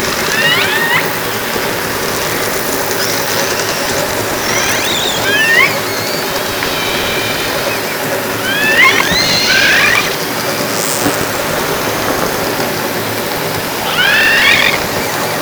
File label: Orca sound Night Passby